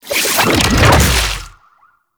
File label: Gouache Teleport Out A; Warframe; Follie